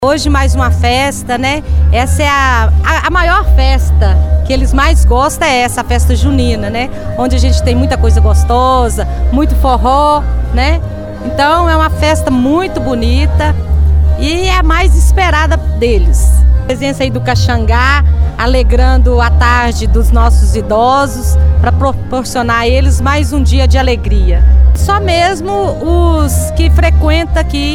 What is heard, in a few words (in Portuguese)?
forro